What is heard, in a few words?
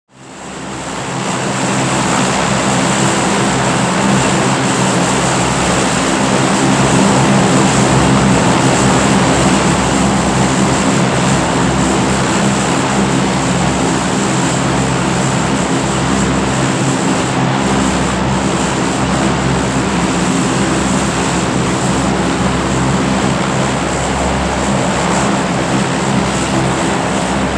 Prop Plane Taxi Hercu, hercules